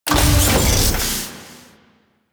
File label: Door Large Open; Ambience; Warframe; The New War; Quests; Zariman